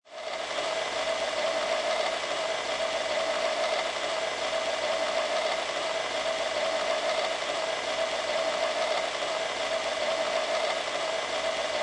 Conveyor Belt, Small-to-medium conveyor helt, running steady.; LynneMusic